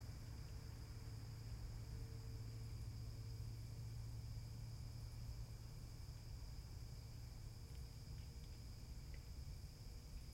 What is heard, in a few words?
yamanakako sound